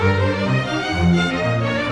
Strings; Sonic Foundry (Sony Creative Software) - Orchestral VOL-2

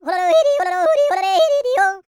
FAST YO, World Voices; ILIO; Vocal Planet

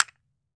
CASTANETS; AKAI S6000 CD-ROM - Volume 5

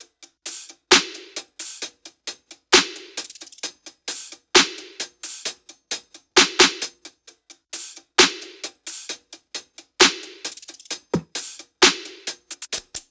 loop top 132, Seismic Loops

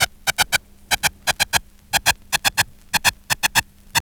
FX LOOPS

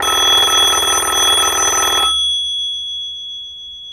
PHONE; BELLS

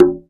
CONGA, CONGAS; Best Service; Dance Mega Drums CD2

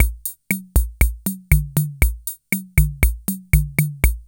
MAMBO; INIS - Funky Xtreams; KR55 LP2

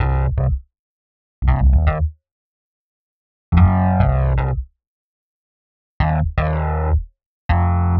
Rhodes Bass C; PowerFX - Nu Jazz House

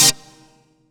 Lead C3; 90s Sample CDs; Club Techno

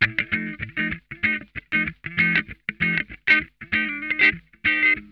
MUNCH, Funk Guitar; Zero G - Funk Guitar

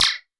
Zing, Roland SH-32 Drumset

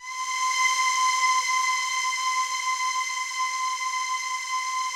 DEEP PAD C5, Yamaha VP-1; DEEP-PAD 3; Music Store

